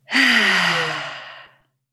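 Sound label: Female Satisfied Sigh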